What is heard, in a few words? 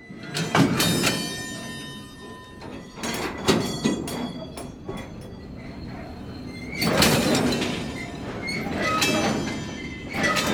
shunting track, train; best service Blue Box